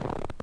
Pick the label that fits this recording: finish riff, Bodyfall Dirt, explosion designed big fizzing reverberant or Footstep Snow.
Footstep Snow